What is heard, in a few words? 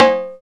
FM Perc; Rap